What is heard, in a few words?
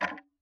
Tick, Clocks Kitchen Clock